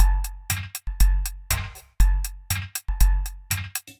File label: Sweep Surveillance Kit, drum racks; Ableton; Factory Packs; Mood Reel